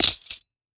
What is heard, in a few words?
Emergency Handcuffs Open Droped In Leaves; emergency effects; AdobeCC